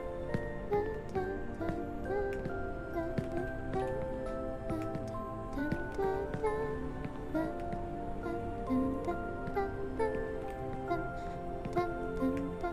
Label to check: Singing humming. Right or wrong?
Right